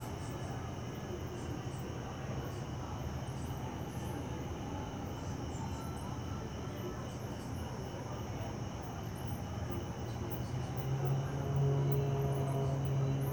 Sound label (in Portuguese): Ambiencia residencial fim de tarde muitos grilos e cigarra, aviao passando; devagar e; ambiencia domestica